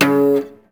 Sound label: Cello Hit String Snap; SFX Kit; Foley - Misc